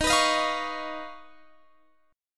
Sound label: D sharp 7th Suspended 2nd Sharp 5th, Guitar, Standard; strummed; capo 3; x,18,21,20,18,21